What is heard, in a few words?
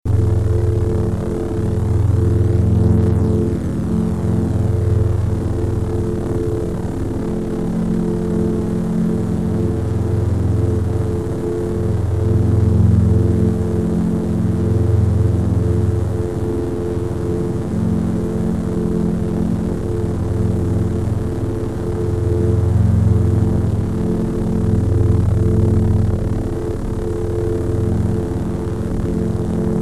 Double Bladed Saber Sound; FXHome